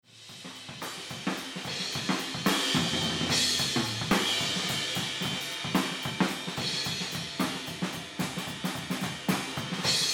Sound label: Mix Drums Room Limit On